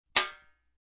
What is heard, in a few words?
Jazz Snare Hit